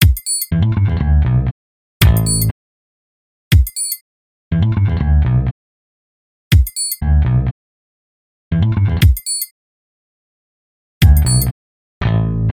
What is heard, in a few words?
hip hop